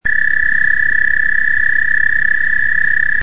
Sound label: american toad